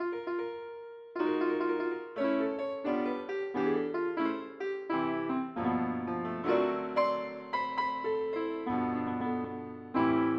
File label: piano